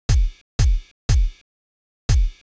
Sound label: kick; hydrogen